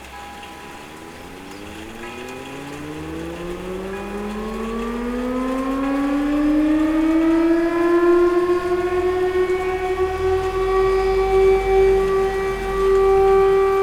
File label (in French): Début Sirène Pompiers